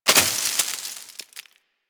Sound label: Landscape Plant Destroyed; Warframe; Ambience; Venus Open World